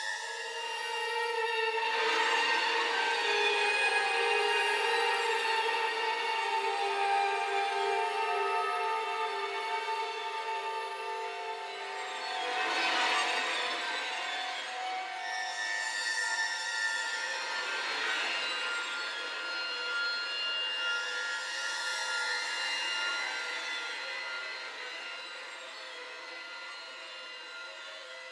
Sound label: Suspense, Loop, 81bpm, Classic Horror Sci-Fi Music Pack; Dead Ark